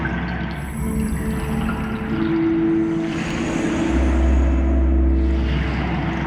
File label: holographic holy amb